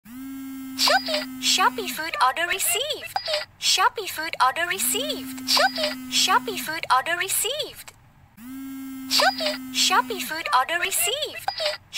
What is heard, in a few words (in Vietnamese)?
Nhạc chuông shopee food